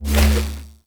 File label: Fishing Spear Charge; Weapons; Venus Fishing Spears; Warframe